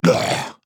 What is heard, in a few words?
Death; Atlas